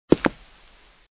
footstep left; openspace3d; fps navigation

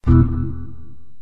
bumper; openspace3d; kinectdemo

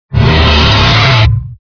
trex; openspace3d; test platform

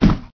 hit; openspace3d; fps navigation

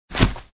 jump; openspace3d; fps navigation

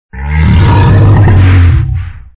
mammoth; openspace3d

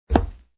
step metal; openspace3d; fps navigation

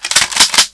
reload, paintball; paintballav

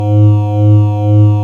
laser loop; openspace3d; tobii

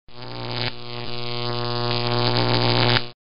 outlet, kitchen; openspace3d; kidkitchen

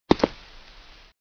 footstep right; openspace3d; fps navigation

